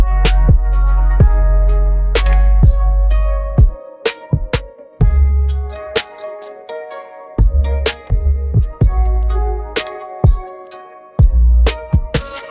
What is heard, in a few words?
Japanese koto, lofi; musicgen